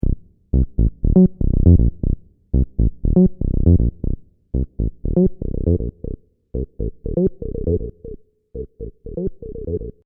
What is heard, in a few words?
Oberheim Bass